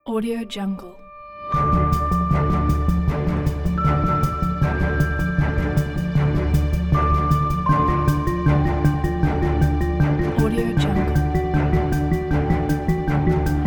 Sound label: scifi story